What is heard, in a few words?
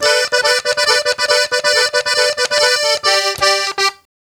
Accordion Lik 139 G; Zero-G Kocktail Kollection; Accordion Lix